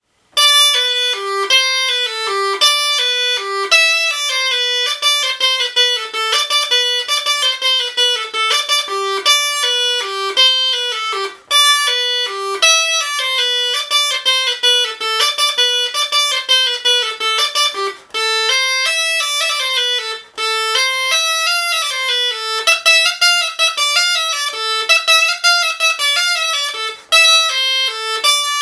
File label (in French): flute; Doudka